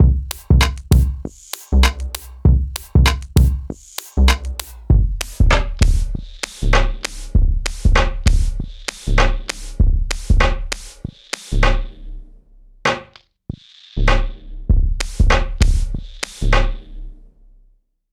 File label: Digitakt Sample; Digitakt Sample Pack 1 DEMO